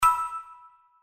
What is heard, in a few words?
Win Animation End; slots; Outdoor America